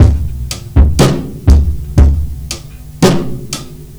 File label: R&B Drums